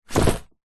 foley garment bag fabric drop fall to carpet floor; zapsplat